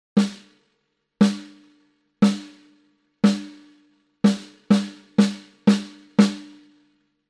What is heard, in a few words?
snare